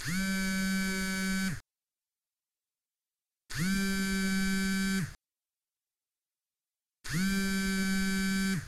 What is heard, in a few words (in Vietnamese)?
Tieng dien thoai rung